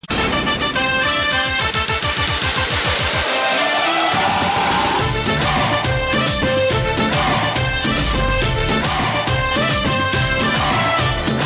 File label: Kick